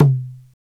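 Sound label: perc darabuka; Roland LCDP14 Africa VOL-2; Af. Hand Drm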